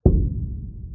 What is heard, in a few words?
impact